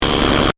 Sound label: Glitch Stutter, Transition; Production Element, Imaging Element, Accent; Blast Wave